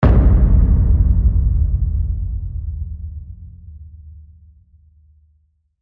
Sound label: Soft Hit